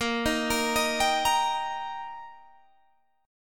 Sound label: Eb Major Bb